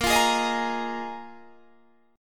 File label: D sharp Suspended 2nd Suspended 4th; chordshape